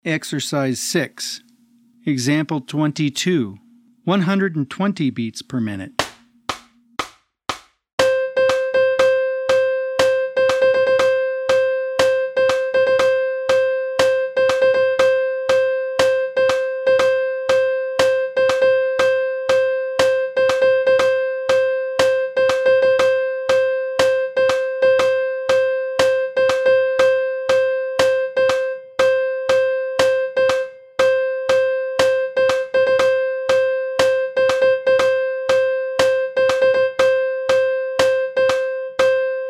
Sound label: 120 BPM